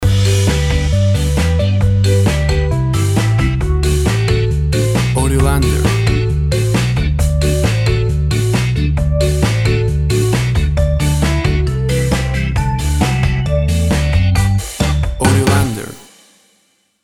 Turtle Bay

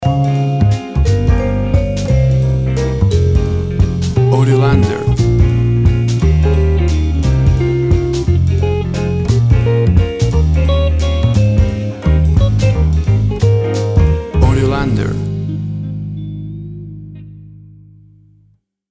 Toe Tapping Jazz

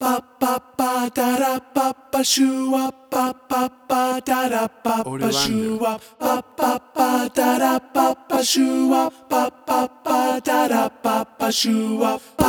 Doo Wop; full mix

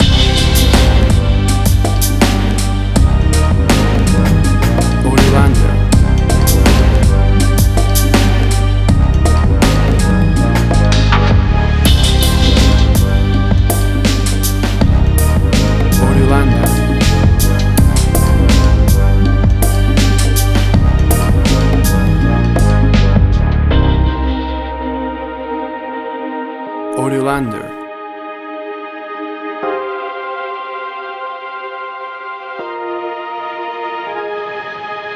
Timid Orchestral Hip Hop

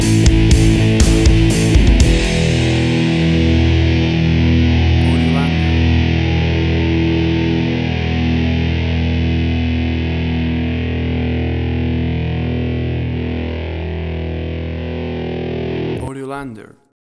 Aggressive Rock Music Stinger

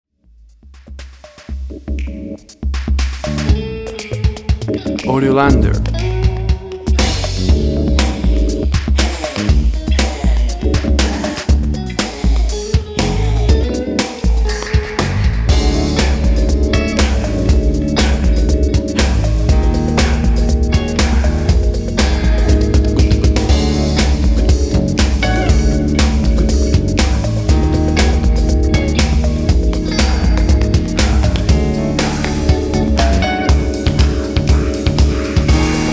Acid jazz

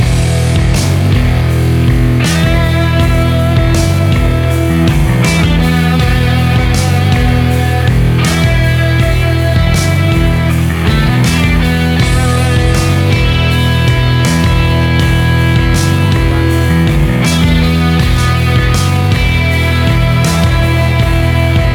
Angry Classic Rock Music Mix

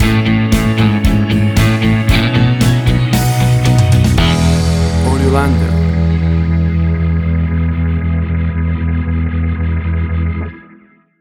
Stinger

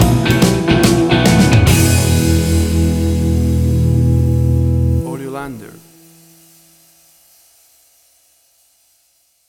Blues Shuffle Country Star Stinger; AUDIOLANDER

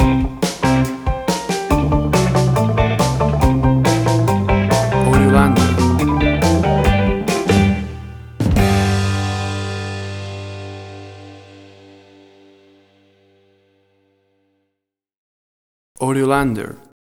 50s Surfing Music

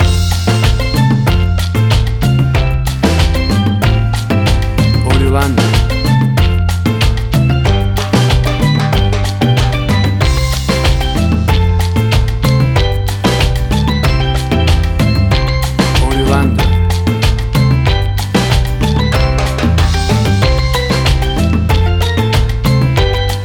Bouncy Calypso Beat

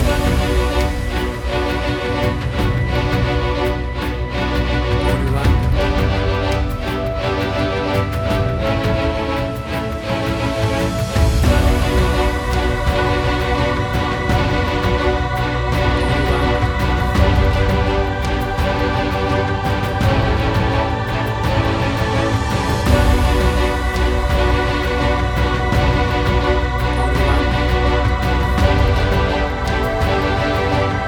Big Finale Choir Strings

